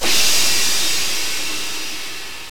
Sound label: Lo And Noisy, Drum Hits; Zero-G; Soundclash